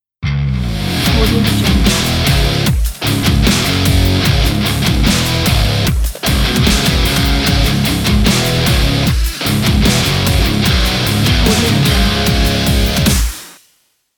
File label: drumstep metal logo